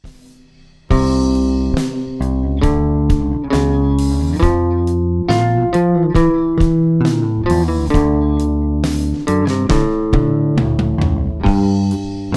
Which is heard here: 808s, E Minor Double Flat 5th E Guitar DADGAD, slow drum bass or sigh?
slow drum bass